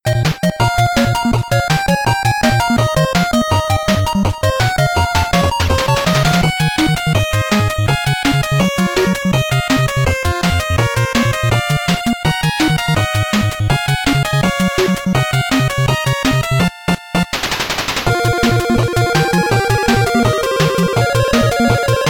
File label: BGM BOSS 8bit; Mighty No. 9; 8-bit soundtrack